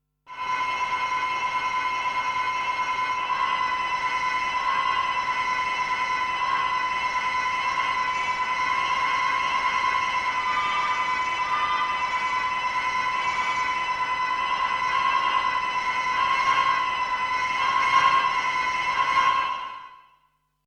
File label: siren